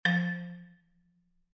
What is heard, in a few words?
tone